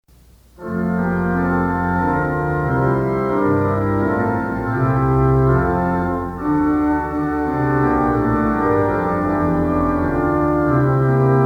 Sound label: Organ Music for Worship Services; Give Thanks to God, His Praises Sing; Twenty Liturgy Hymns